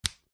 household switch plastic slide click; zapsplat

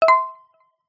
multimedia alert generic mallet wood

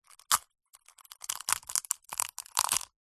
egg shell movement crack lightly; zapsplat

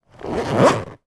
zipper done up on cloth makeup bag; foley

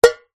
tin can hit; foley

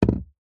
apple set down on table; food; zapsplat

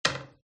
toilet bowl; fox audio

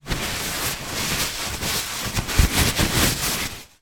foley toilet paper movement; sound effects one

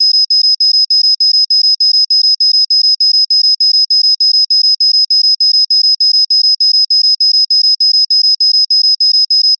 science fiction console alarm beeps; zapsplat

